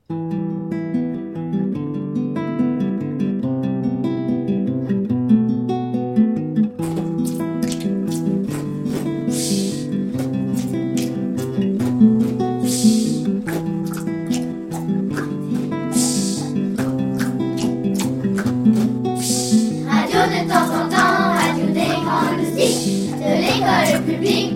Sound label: jingle radio